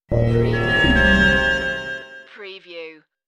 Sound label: Scifi Audio Logo Ident, Short, Mysterious, Bowed Metal